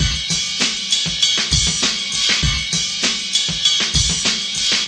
99 bpm deep back clanger, drum loops and breaks; 091 to 100 bpm

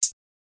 Hat; Khezie Hip Hop Kit

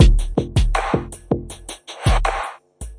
80 bpm rock bonger, drum loops and breaks

